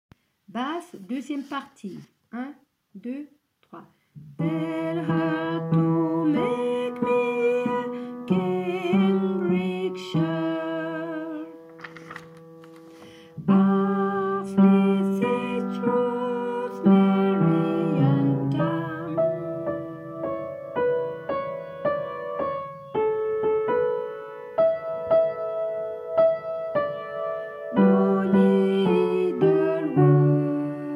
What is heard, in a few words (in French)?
Basse partie 2